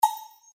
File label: rollup single; Lucky's Fish and Chips